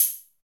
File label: HH HH, Dance